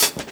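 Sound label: Closed Hi-Hat, Dance Drums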